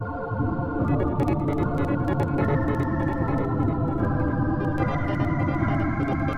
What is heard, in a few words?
Long Hallway D Ambient